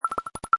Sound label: Cartoon Fast Pops, Comedy raping popping, tapping, clinking; Comedy/Cartoon; Funny Sounds